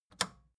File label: record player power push on